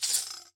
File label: axe shaker